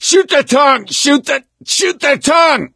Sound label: choke, gambler, survivor voice; source engine; left 4 dead 2